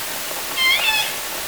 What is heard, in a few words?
call, SRKW, Orca Calls